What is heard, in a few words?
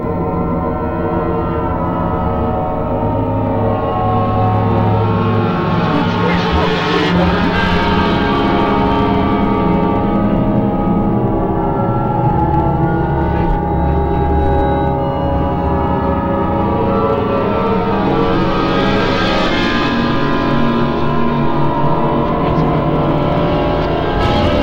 sci fi; Sonic Foundry (Sony Creative Software); Ugly Remnants VOL-2; UR 2 FX (Long)